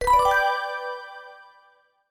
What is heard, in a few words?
decision, button